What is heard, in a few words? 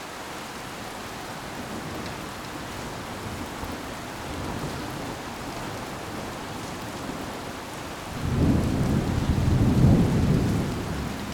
rain thunderstorm; antonijas riga